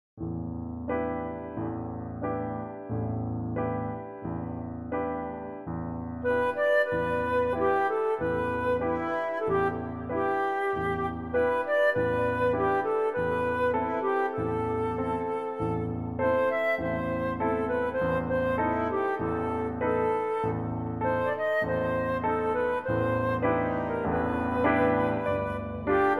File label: piano 2 flute; piano cover